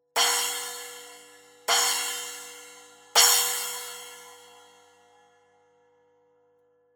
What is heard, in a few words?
10 I Splash hits, cymbal